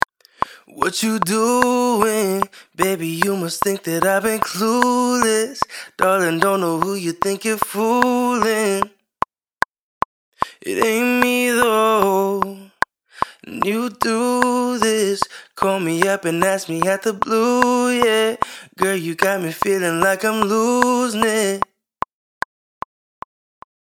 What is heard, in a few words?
Vocal